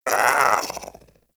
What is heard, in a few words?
Pet Speak; Warframe; Catbrow; PetShip